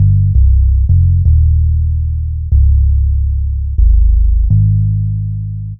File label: G1 165bpm, Drum & Bass, Bass Loops; Total Drum&Bass